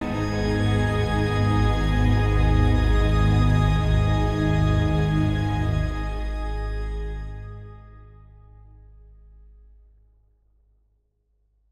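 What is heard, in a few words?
Music Transition End Short; from dust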